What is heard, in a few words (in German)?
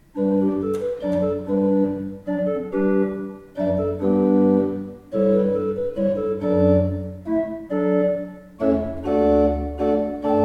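Schuke Berlin, pfeifenorgeln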